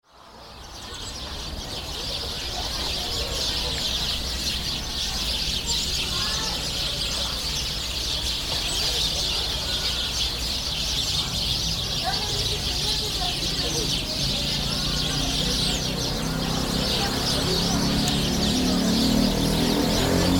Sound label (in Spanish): uspallata zona centrica